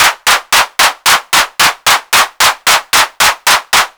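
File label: clap, saturated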